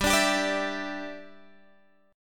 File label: G 5th Add 13th G Guitar Standard, chord